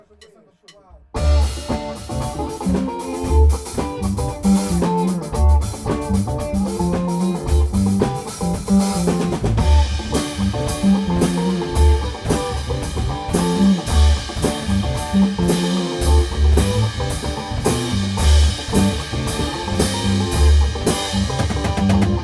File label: Funky Part Endings